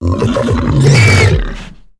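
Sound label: kinrath hound; khoundB; Star Wars Knights Of The Old Republic I; XBOX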